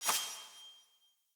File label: Short Sword Perfect Wind Up; Weapons; Player